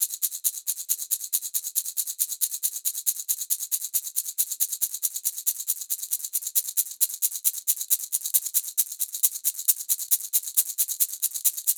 Gourd shaker; Nightsong (Hobeea)

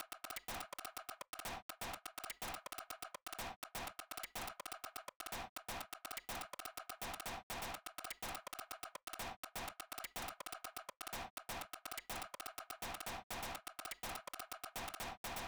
fake drums

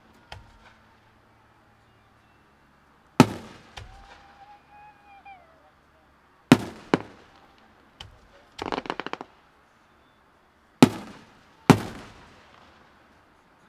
fireworks; Ambisonics

